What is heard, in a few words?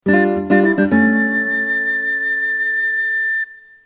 Item Fanfare; legend of zelda a link between worlds; Milk Bar